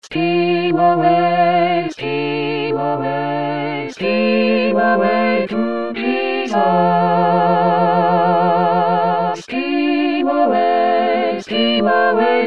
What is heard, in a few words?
Tutti; Steal away